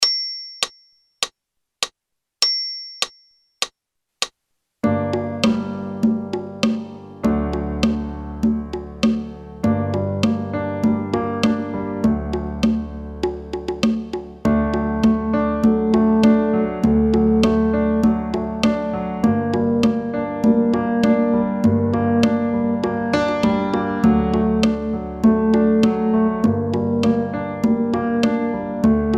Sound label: 6 8 Minor Mystery in Bb with count in, brass